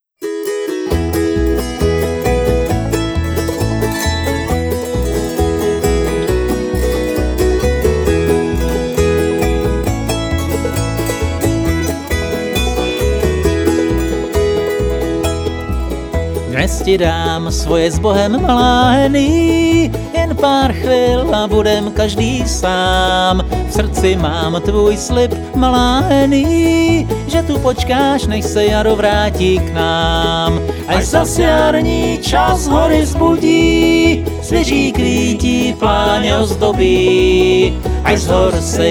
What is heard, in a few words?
autoharp fragment